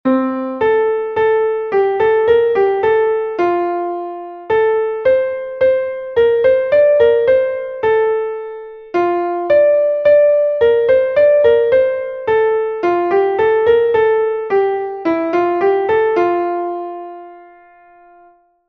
soprano, himnos en piano; cantos del camino

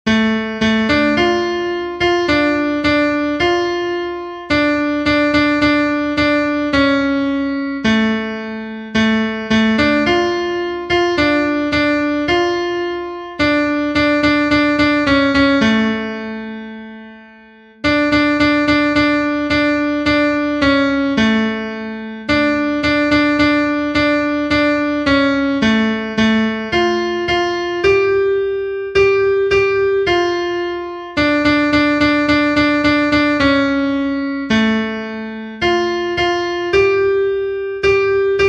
contralto, himnos en piano; cantos del camino

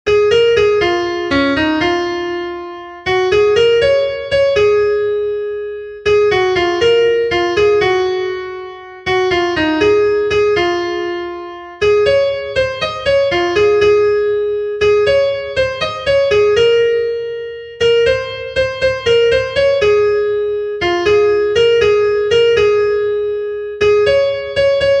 SOPRANO, himnos en piano; EL CUIDARA DE MI; cantos del camino

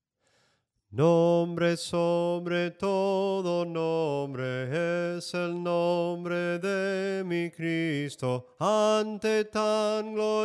SOPRANO CANTADO

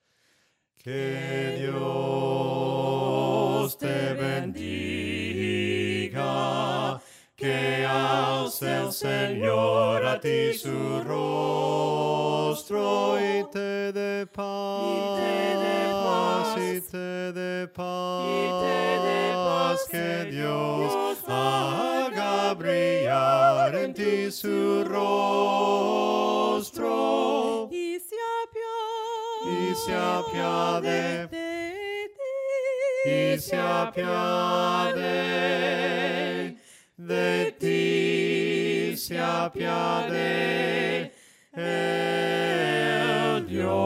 VOCES, himnos a capella; cantos del camino

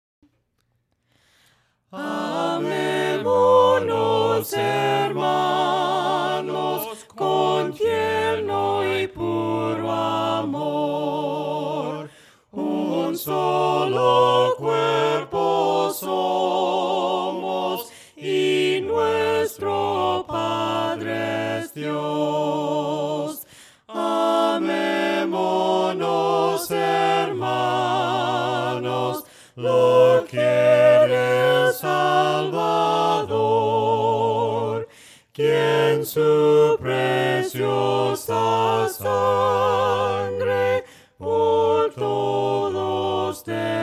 AMEMONOS HERMANOS VOCES, himnos a capella; cantos del camino

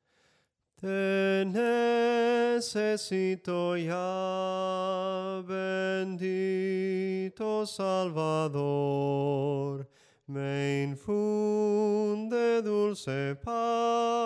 SOPRANO CANTADO; cantos del camino

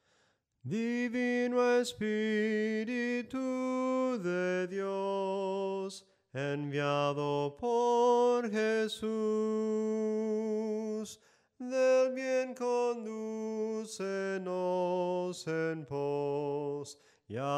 SOPRANO CANTADO, himnos en melodia soprano

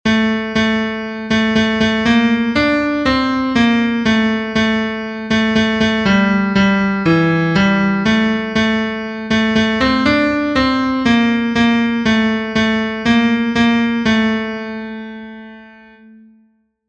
TENOR, himnos en piano; 32 GRACIAS POR TODAS LAS MANANAS; cantos del camino

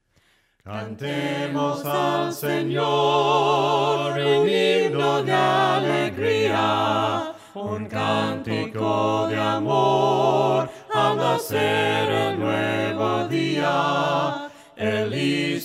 VOCES, himnos a capella; cantos del camino; CANTEMOS AL SENOR

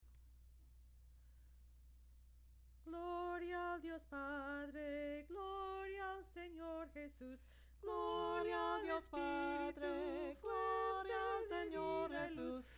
GLORIA AL DIOS PADRE, himnos a capella; cantos del camino

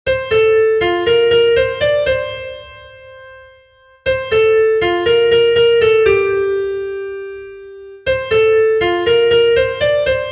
SOPRANO, himnos en piano; 321 AMA SI QUIERES SER FELIZ; cantos del camino

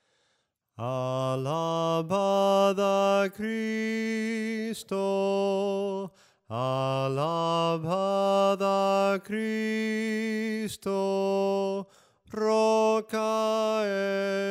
SOPRANO CANTADO, himnos; ALABAD A CRISTO

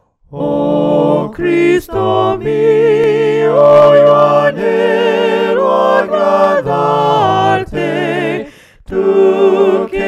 VOCES, himnos a capella; OH CRISTO MIO; cantos del camino

